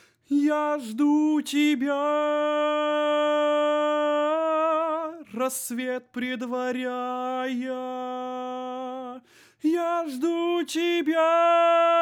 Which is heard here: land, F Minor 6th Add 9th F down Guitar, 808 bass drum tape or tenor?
tenor